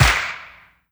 hand clap d key; percussion; single hits one shots

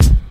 rich top end bass drum one shot f sharp key

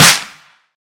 Long Tail Reverb Steel Snare Drum D Key

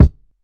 tamed hi hat g key; drum single hits one shots

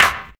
clap sound c sharp key; percussion; single hits one shots

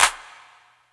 big hand clap c key; percussion; single hits one shots

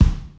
warm bass drum sample D key

